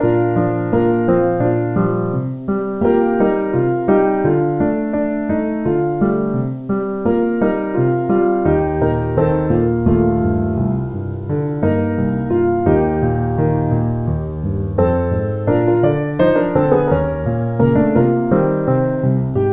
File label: Accompaniment